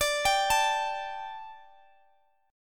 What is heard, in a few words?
D Suspended 4th D